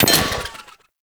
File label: Glyph Target Hit; Duviri; Glyph Puzzle